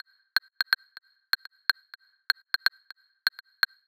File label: 124 drum loop growler percussion; ENZO Drum Signature Bundle Vol 1